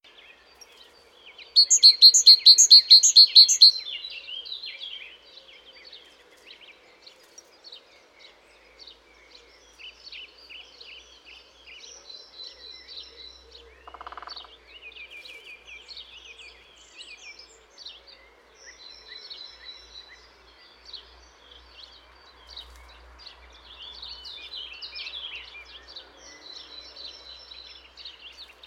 Parus major; SETE

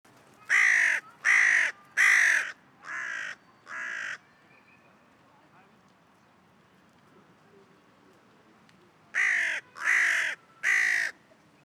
Corvus corone; SETE

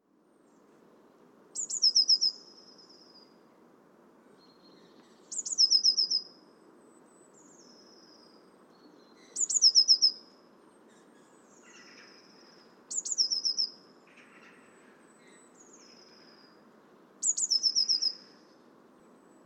Cyanistes caeruleus; SETE

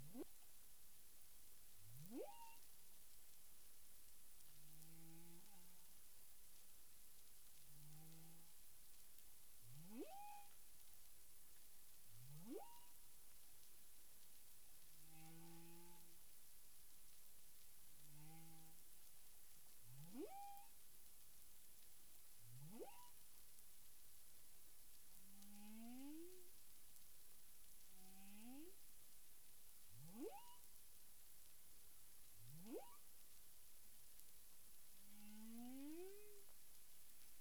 humpback whale; CARIMAM

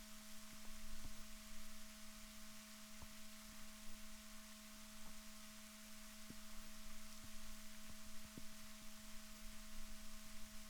rorquals negatif, Balaenoptera Physalus Chile

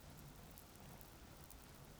pulses, BALAENOPTERA; MONACO 2022-07

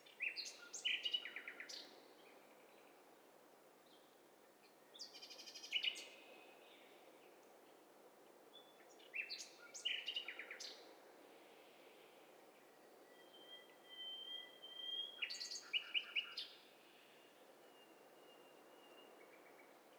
STEREO BIRD COCKTAIL PARTY

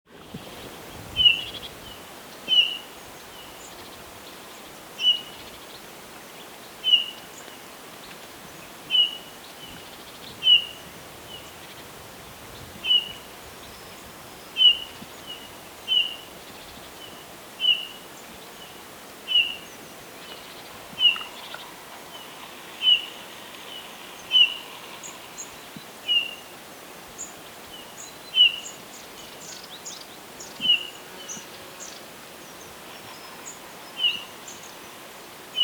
Pyrrhula pyrrhula; SETE

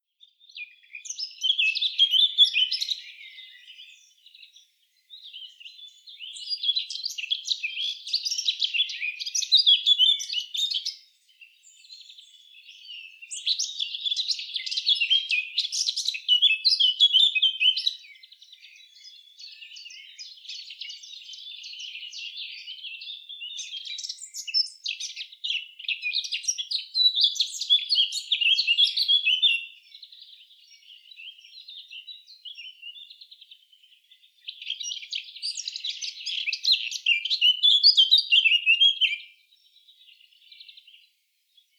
Sylvia atricapilla; SETE

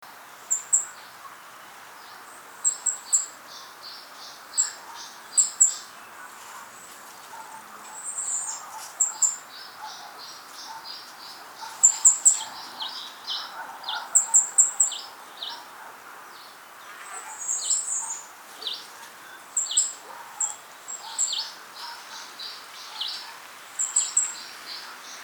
Erithacus rubecula; SETE

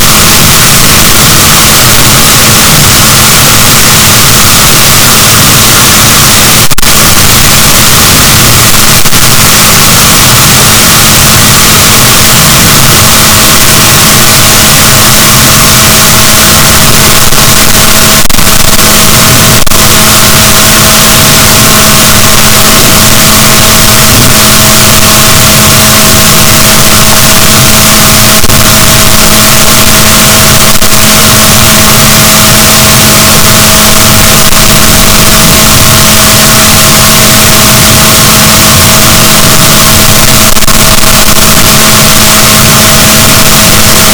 rorquals, Balaenoptera Physalus, boat

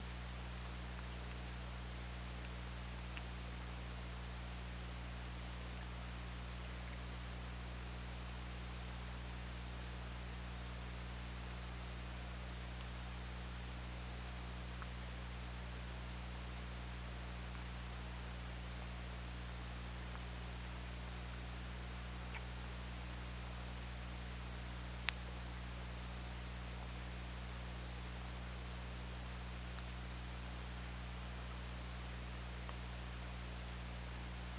OrcaLab

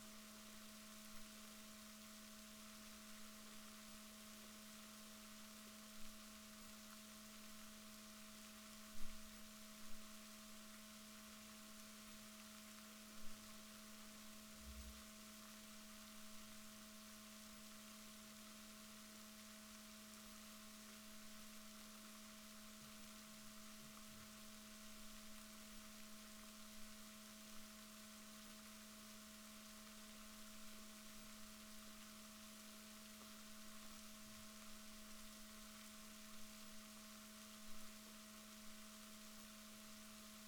rorquals negatif, Balaenoptera Physalus Chile

